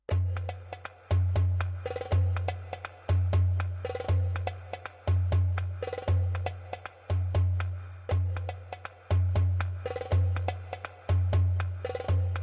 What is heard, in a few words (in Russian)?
arabskaia fonovaia muzyka bez slov